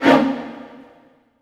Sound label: HIT, Orchestral; Orchestral Loops; 128 PSYCHO